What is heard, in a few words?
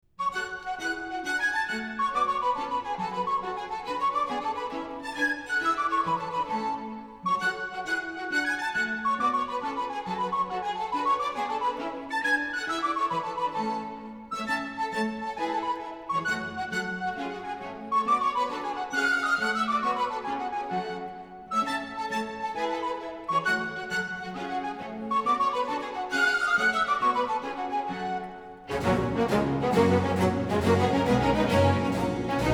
Country Dance; HANDEL; Water Music; Pinnock; Suite 03 in G (HWV350)